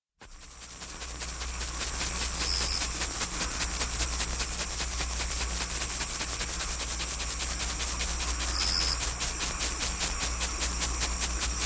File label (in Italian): cavalletta verde, Insetti; Monte Pisano; Animali; I Tesori del Monte Pisano - I Volume Gli Animali